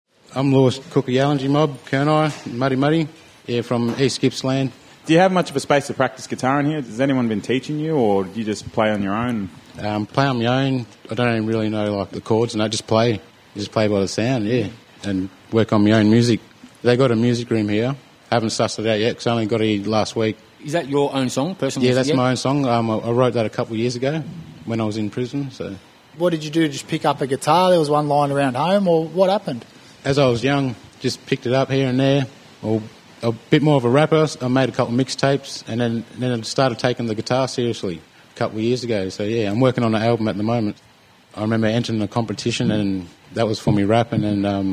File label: Playing guitar; Beyond the Bars 2025; 3CR; NAIDOC Week; First Nations